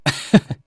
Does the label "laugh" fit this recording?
Yes